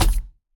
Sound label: player mvt grab player